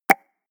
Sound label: pop